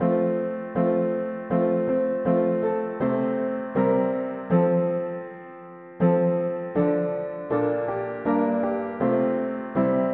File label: mono piano; Poor and feeble though we be; hymns